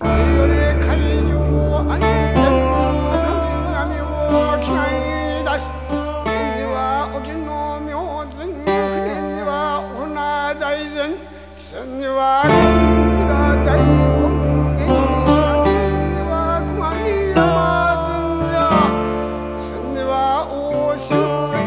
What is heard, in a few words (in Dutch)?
zenmuziek met stem; OUTDOORS